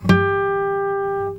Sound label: NYLON HARMO